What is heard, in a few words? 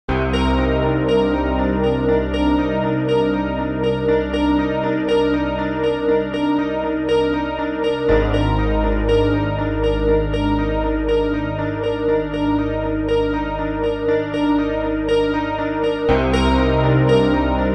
SUPER CP LAYER